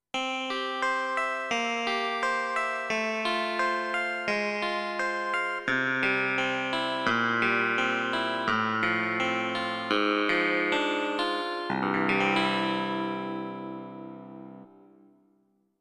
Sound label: Clavi, Yamaha Clavinova CLP-270; I SUONI - GLI STRUMENTI XG